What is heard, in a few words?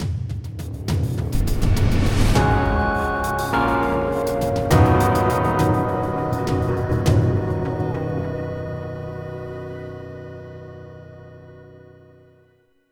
victory; FTL